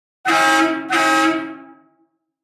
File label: truck horn notification